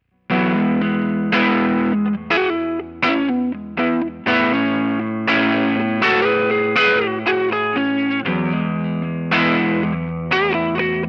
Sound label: Mad Professor Super Black Blackface on Compression EQ